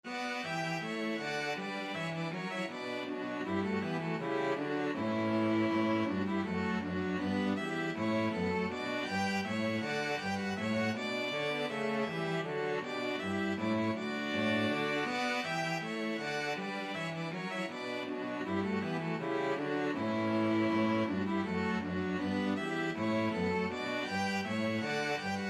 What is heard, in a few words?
bourree, string quartet